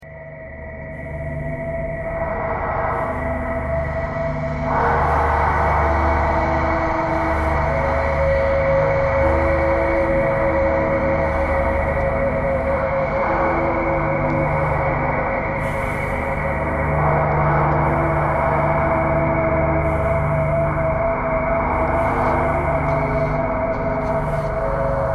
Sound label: thread simple